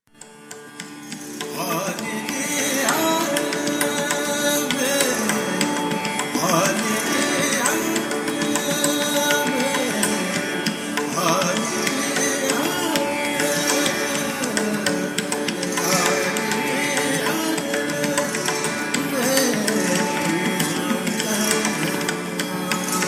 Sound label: raga behag drut